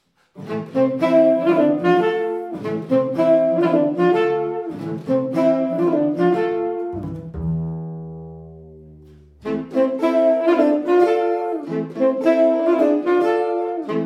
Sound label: Swing Band